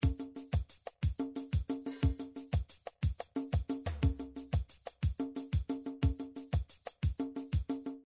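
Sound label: drums african, midi drums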